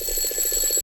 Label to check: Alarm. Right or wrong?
Right